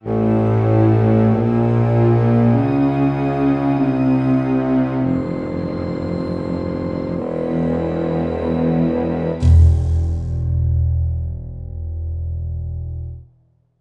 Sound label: Sitar